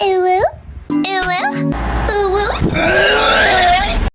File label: uwu throw up